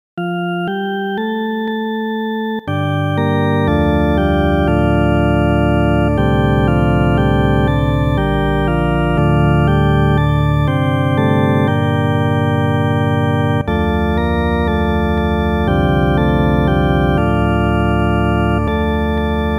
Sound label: solo organ; pater noster